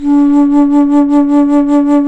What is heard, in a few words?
FLT, Bass Flute, BsFlt vib; Roland LCDP04 Orchestral Winds; 90 s Sample CDs